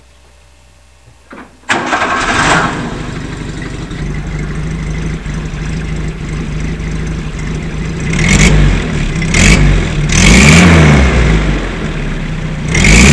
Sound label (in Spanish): R10 arranca relenti y para